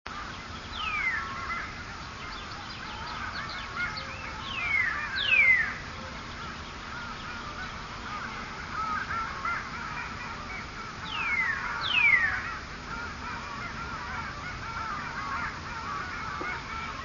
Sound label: oldsquaw cardinal, birdsongs